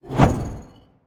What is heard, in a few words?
sickle swing; smite; osiris